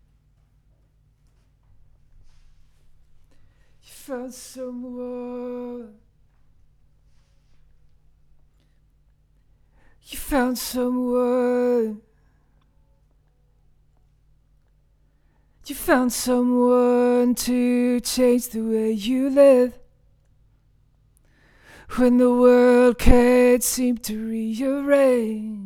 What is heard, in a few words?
Vocal